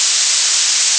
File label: blue noise